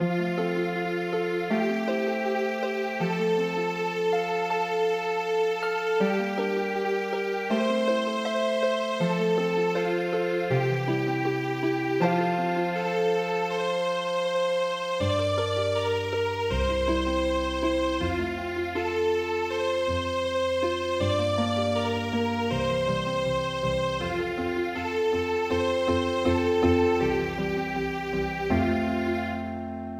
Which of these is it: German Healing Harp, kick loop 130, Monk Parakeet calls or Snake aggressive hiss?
German Healing Harp